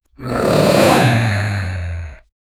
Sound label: demon growling to battle